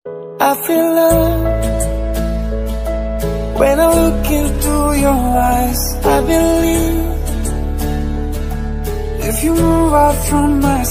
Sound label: english ringtone